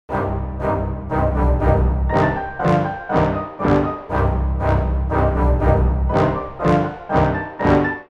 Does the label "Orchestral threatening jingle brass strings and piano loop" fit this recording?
Yes